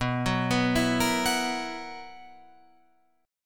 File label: B Major 7th Suspended 4th, Guitar Open F chord; arpeggiated; 11,13,11,11,13,13